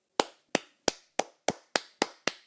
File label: clap, emotes